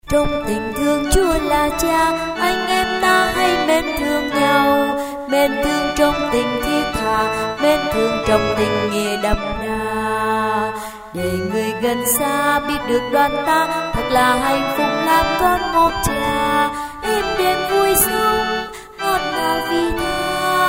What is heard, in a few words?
tenor basso